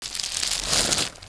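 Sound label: sizzle; duke nukem total meltdown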